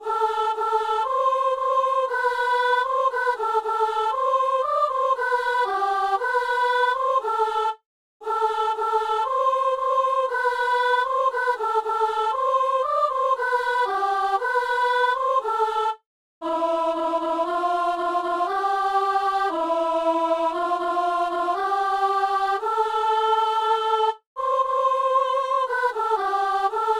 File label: soprano; Messe de Saint-Paul - Sanctus